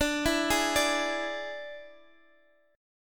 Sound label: D Suspended 2nd Flat 5th, Guitar, Open D